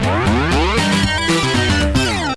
Saloon Music; Riverboat Gambler; Williams pinball

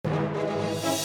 Music Fight Theme Easy 120bpm Start; asterix obelix slap them all 2; Romans

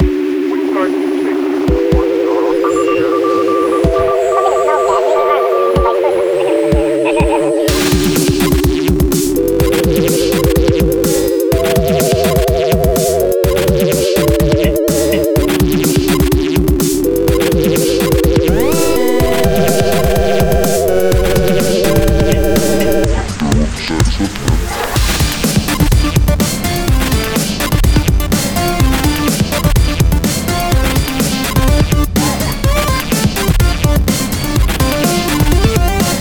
Interference; LISA: The Undone

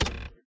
Button; Voices of the Void

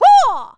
clown female throw; dod clown female a throw 5.wav; Clown Dodger

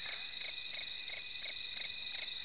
Ambient Loop; android ios gamerip 2010; Solomon's Boneyard

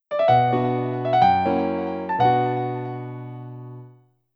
Sad Message; wii room shopping update soundtrack

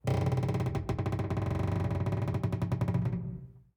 amb boat

